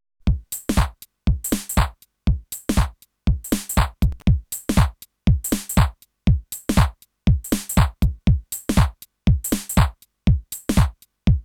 House Dry

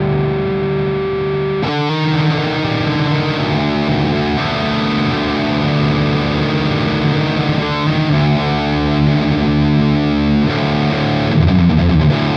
REVV G20 High Gain

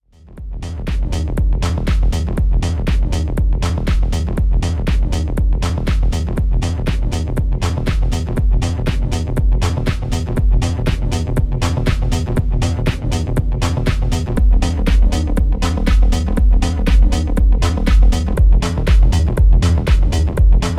Delay; PLX 500

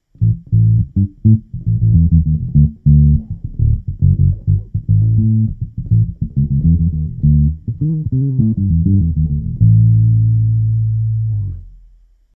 Pure Fingerstyle Oldschool Mic, Bass; Markbass MB58R CMD 102

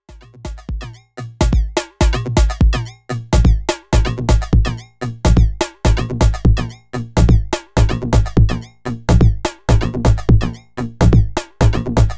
Roland TR-8S TR-6S Fattener; Firmware Update V2.5

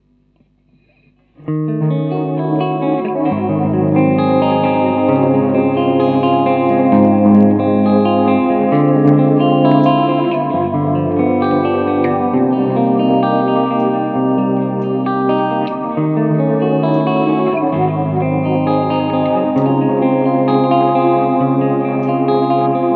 loop chorus

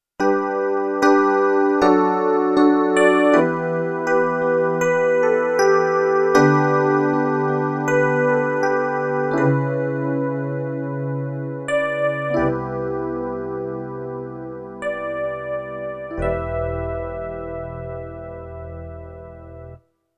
Korg Liano E-Piano

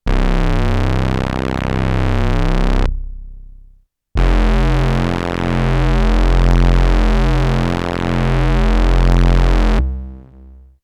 Modal Argon8

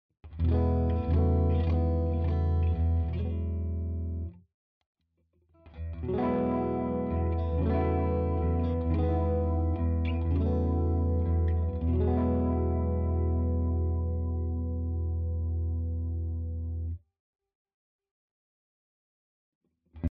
Flanger clean; AxisFlanger

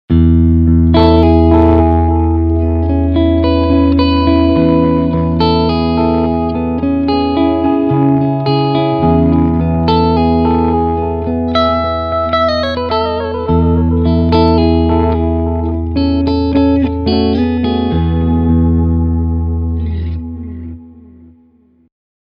delay

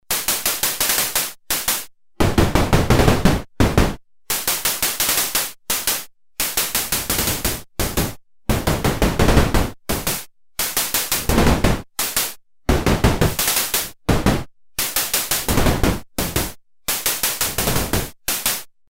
Himalia Analog Noise; Io Instruments

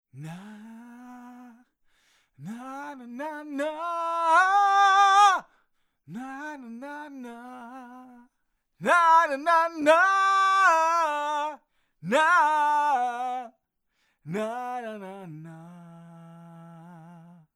Vocals TLM 193

